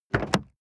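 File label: sports car exterior door open medium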